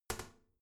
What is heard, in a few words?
toilet lid wall open soft; haus-garten; badezimmer